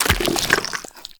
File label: Step Sludge, Footsteps, Walk; Warframe; Tenno